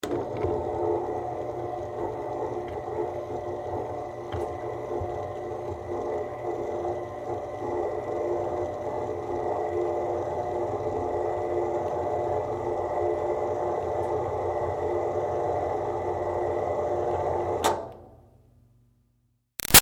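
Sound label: Projector Screen close up Down Long Steady; Squeaky; Offices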